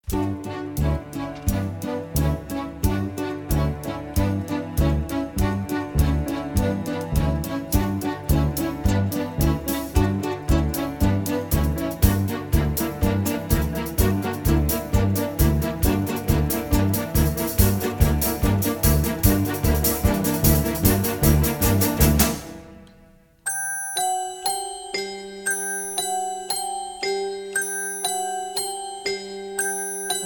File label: Factory Reveal Sequence Inst; willy wonka kids